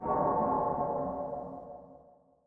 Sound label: death; GameJamDungeon